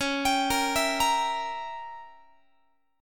C sharp Diminished 7th C sharp down Guitar 4A 4E 5A 5D 5G sharp 6C sharp; C#dim7; arpeggiated